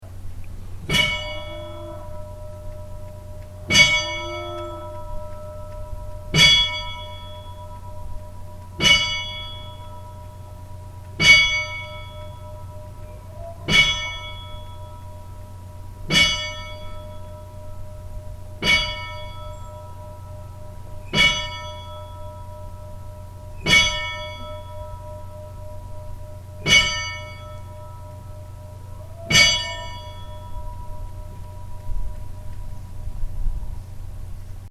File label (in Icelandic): stadarbakkakirkja likhringing; Staðarbakkakirkja var reist árið 1890; Í turni kirkjunnar eru tvær klukkur